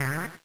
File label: bounce; shweets sim; braixen